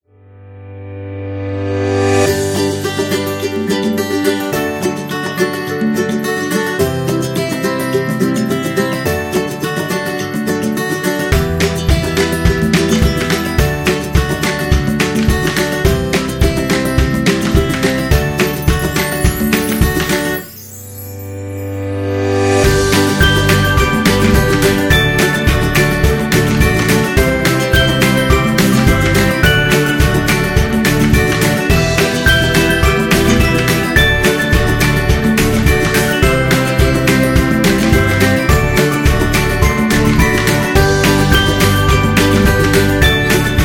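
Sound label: Happy Kids Fun, Kids background music; birthday music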